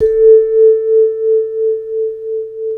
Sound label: MLT VIBE